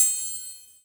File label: Kawai K11 Open Triangle